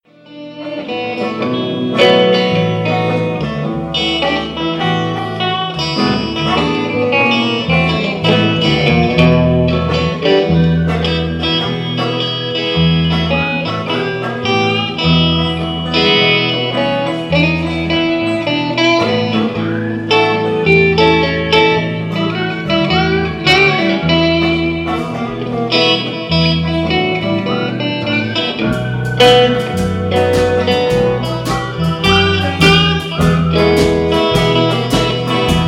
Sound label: Blues Jam